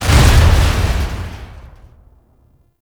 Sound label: Red Barrel Explosion; Gameplay; Awakening Remaster